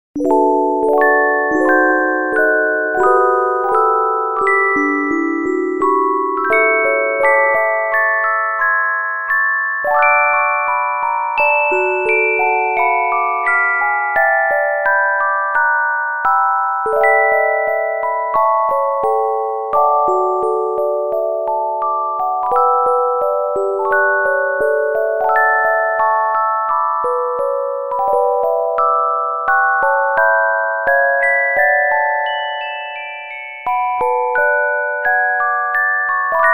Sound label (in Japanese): レトロケータイ着メロオルゴール